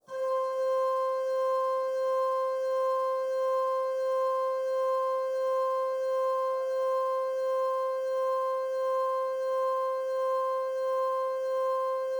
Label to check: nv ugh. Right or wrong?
Wrong